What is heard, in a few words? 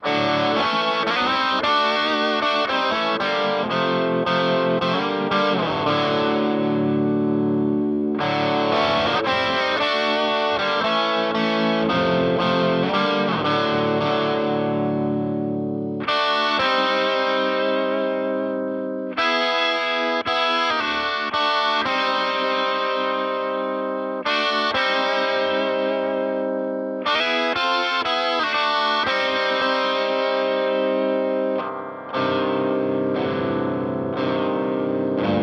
Line 6 HX Effects FX Loop Harmony